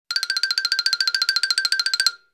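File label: running, funny cartoon sounds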